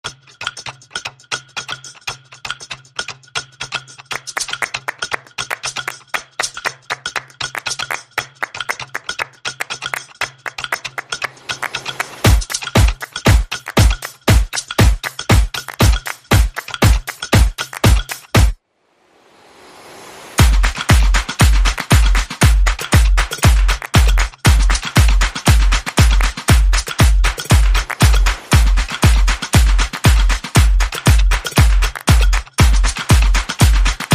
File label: Confetti Cannon, Percussion and Effects